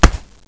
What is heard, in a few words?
tile; valve